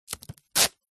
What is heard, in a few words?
office parcel tape peel from roll